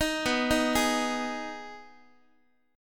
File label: B 6th B down Guitar 4A 4E 5A 5D 5G sharp 6C sharp; arpeggiated